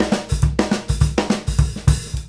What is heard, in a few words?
FILL